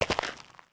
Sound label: Foot Dirt Sneaker Walk, footsteps